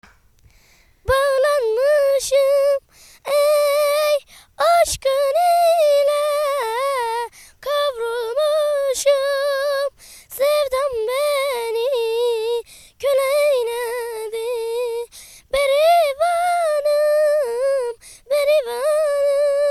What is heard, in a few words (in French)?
chant Petite